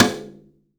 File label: SRDO RIM, SURDO; AKAI S-Series CD-ROM Sound Library VOL-5